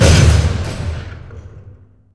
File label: skylift stop; Half Life 2; plats